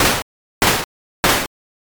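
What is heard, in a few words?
footsteps water walk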